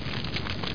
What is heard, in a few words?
torch